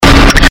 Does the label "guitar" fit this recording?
No